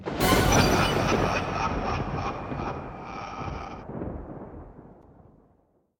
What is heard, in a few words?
finish level